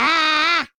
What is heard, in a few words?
Classic Miss Sound; Jolly Battle